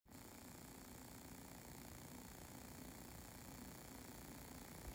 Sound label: noise 35mm; digital to analog audio converter; デジタル端子や同軸デジタル音声端子を、一般的によく使わている赤と白のRCA端子に変換するオーディオコンバーター（DACの一種）